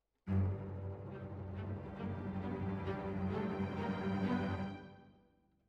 Cellos Over

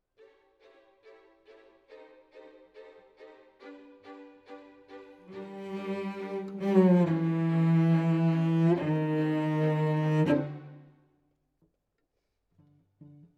Cello Solo

